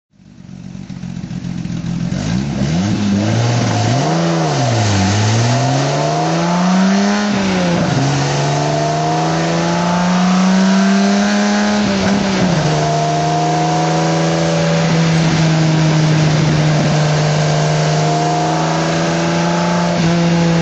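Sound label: lister jaguar drive, Engine sound driving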